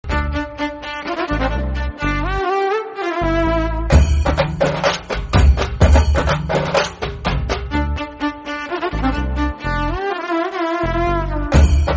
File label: kannada instrumental bgm